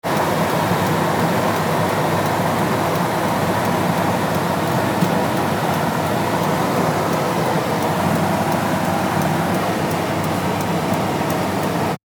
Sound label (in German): Auto Luefter Blinker